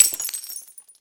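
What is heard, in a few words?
glass bottle smash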